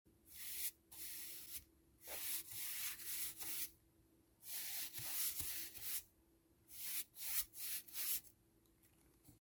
zhestkaja malenkaja kist po doske; Звуки кисти художника или маляра

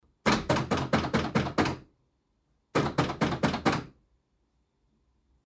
stuk po ruchke vhodnoj dveri; Звук стука в дверь

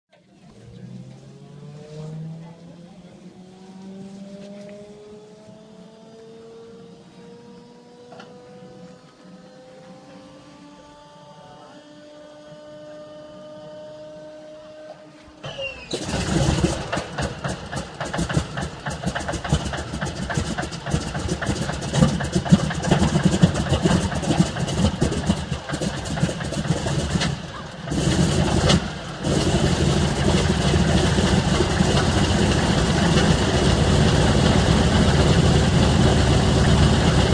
Kukuruznik zapuskaet propeller

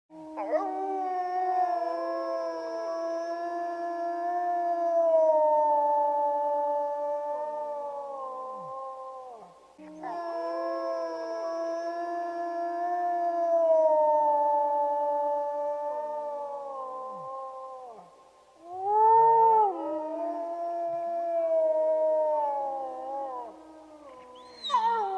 dva volka

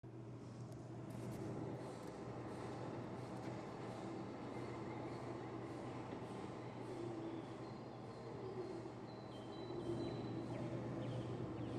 Zvuk goroda za oknom signalka mashiny; Звук города

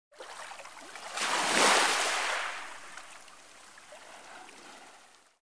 mjagkaja volna na pesok

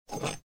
berut za ruchku

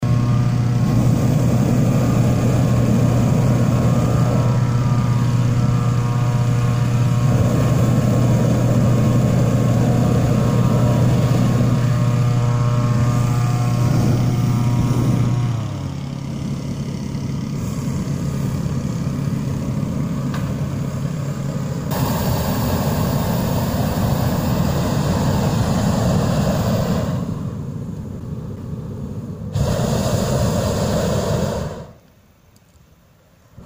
zvuk naduvanija holodnym vozduhom potom dujut gorjachim i shar podnimaetsja

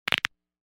hrust kostej pozvonochnika

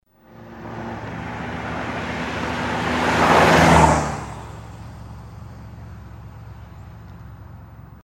bolshaja mashina

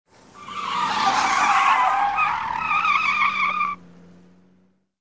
mashina na bolshoj skorosti rezko pritormazhivaet s vizgom i edet dalshe; mashina rezko pritormazhivaet s vizgom i udaljaetsa

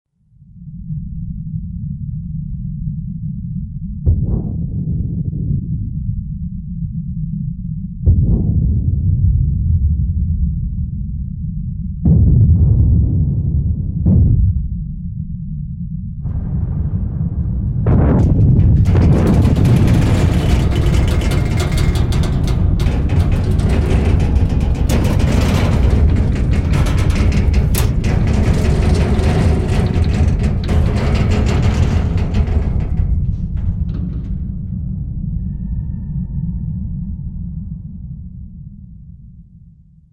zvuki popadanija v lodku vzryvy i metallicheskie zvuki trjaski; Звуки подводной лодки

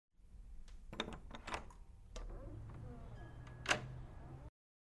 krepkaja derevjannaja vhodnja dver

